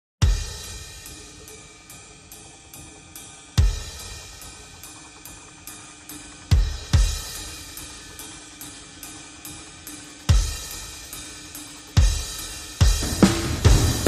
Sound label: Drums